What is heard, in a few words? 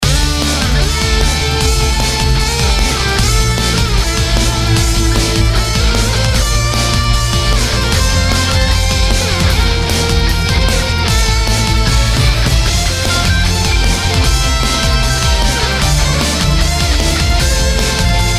bgm battle; Soul Crash; Android